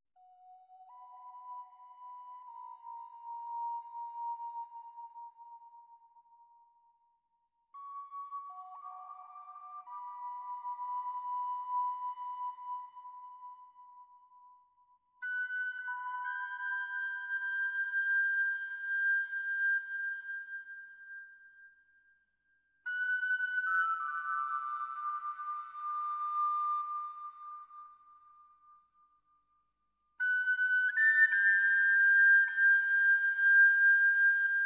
Flute; Trois Couleurs Bleu Blanc Rouge

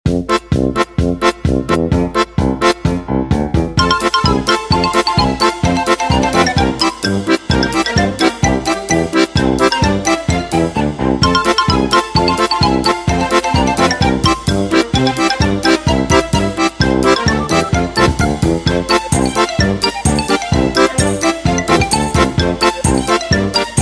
Funny Polka

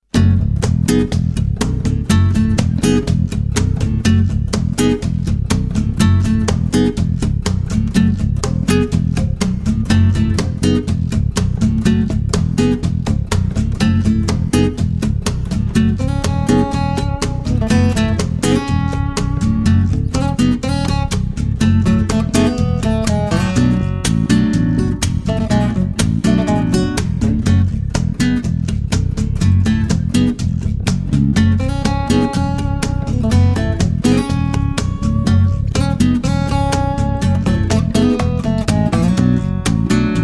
Magic Acoustic Guitars

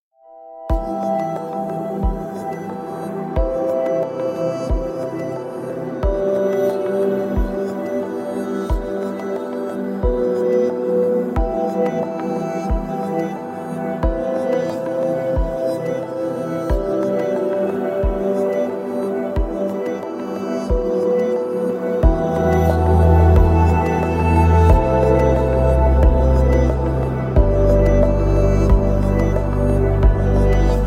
Ethnic Ambient

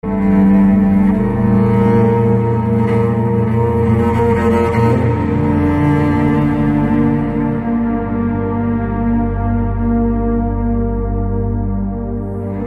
Silent Mind

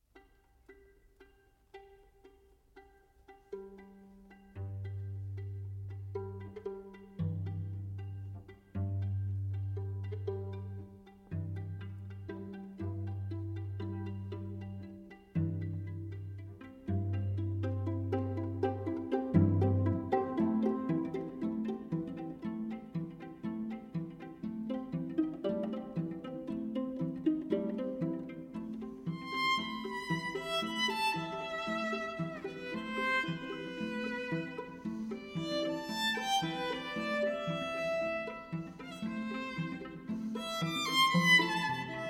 String Quartet; Greek Music

